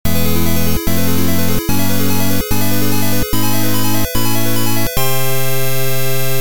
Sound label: Level Complete; Legendary Axe